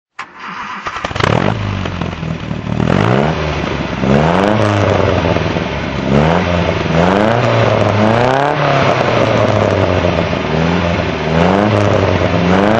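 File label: mg mga 1500 throttle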